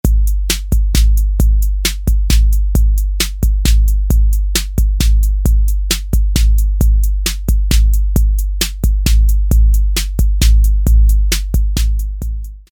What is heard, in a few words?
Rhythm, Roland TR-808; Factory